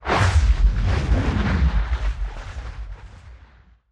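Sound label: Small Underwater Explosion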